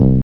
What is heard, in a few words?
BASS